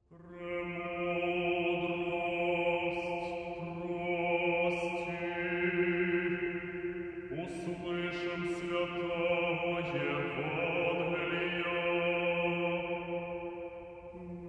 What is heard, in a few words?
Choir Liturhia; Kyiv